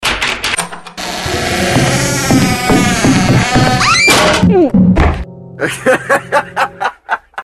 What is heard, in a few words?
suspense ring alert